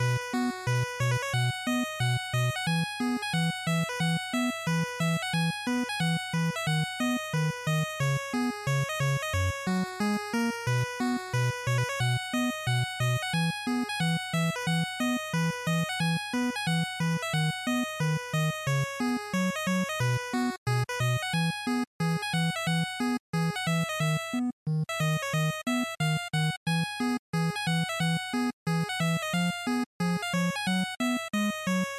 NES Shooter Title Loop 180

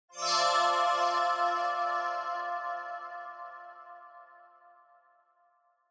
Multi Accent Long

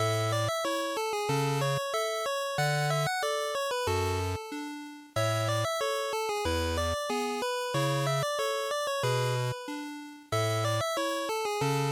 GB RPG Town Loop93

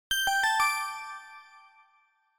Chime Announce

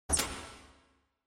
TV Turn Off Reverb

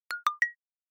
Smartphone UI Sound Dry

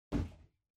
Cardboard Box Far Put Down; ダンボール; 遠い 置く; 梱包材

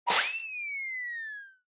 Motion Blow Away Mid

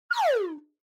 Slide Whistle Mid Down

